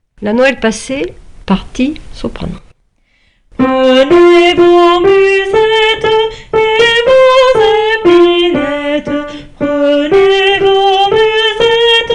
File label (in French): La Noel Passee Soprano